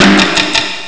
drum; Amiga Soundtracker Sample Packs

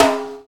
DANCE SET, DRUMS

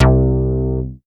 C3, BASS; Q Up Arts; Dream Experience; BASS BANK 12

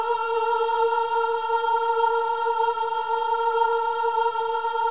VIB M A#4 -R, Symphony of Voices; Spectrasonics

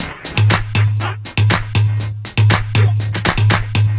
120 No Bd, Drum Loops 120bpm; Abracadabra; Nu Dance Electro

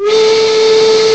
HOOTER; M8; CMI Factory

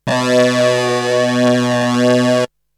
Synth; ZERO G - Datafile DISC 3

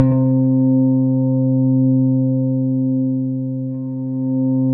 C3, SynthLine; 90 s Sample CDs; NiceBeats; SynthLine VOL-33

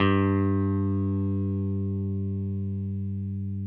6STR BASS, G; Sampleheads; Whole Lotta Country 1 & 2

